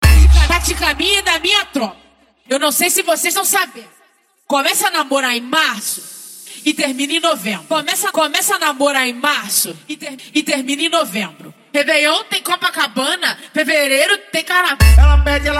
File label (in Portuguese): racha eletronica e funk mega bass